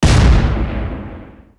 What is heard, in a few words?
explosion medium, bomb; gbombs 5